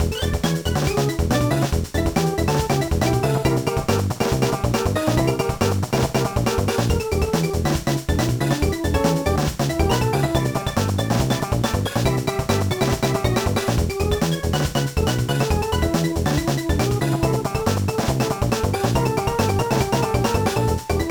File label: BGM, gba; Backyard Baseball 2006